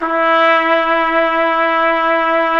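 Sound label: brass, Tpt P, Solo Ambient; Roland L-CD702; Tpt 1-4 Solo; Tp 3 Ambient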